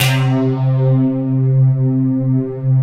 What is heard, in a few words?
METALLICS; Pro-Rec - Synthsonic; TRINITY